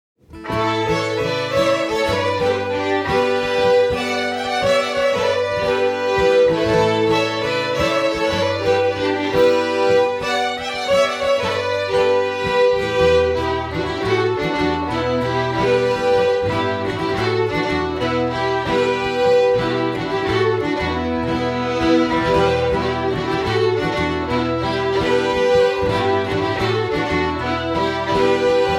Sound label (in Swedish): Polska; efter Faut Marit